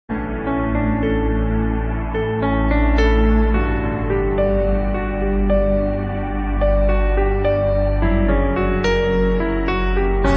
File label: Orchestral Sequence